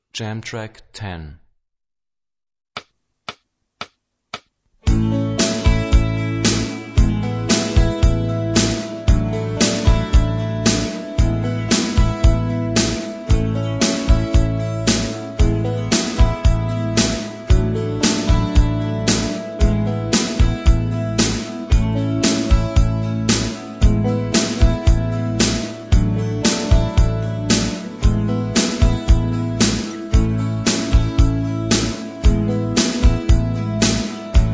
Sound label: Rock guitar; Rock gt Secrets